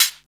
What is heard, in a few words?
HH, Hip Hop R n B; USB Soundscan vol.19